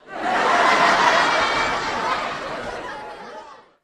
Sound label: Laughter Lrg Crowd Ch